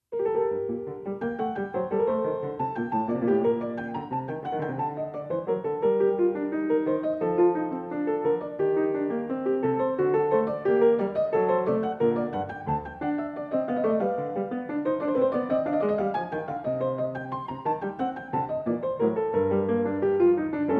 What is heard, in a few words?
English Suite in G minor, Allemande; No 3; Bach; BWV 808